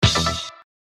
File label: count up end; Party Night